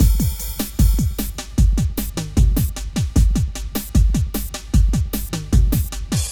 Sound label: drum; bombogroove